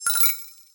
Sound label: system cancel; anemoi